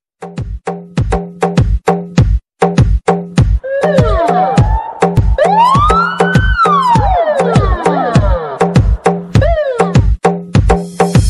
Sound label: Russian and Uzbek bass music